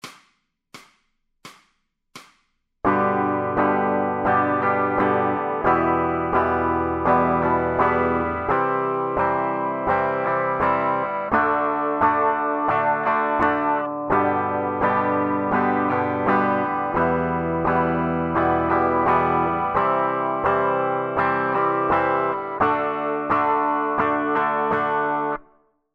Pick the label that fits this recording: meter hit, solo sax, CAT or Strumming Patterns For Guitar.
Strumming Patterns For Guitar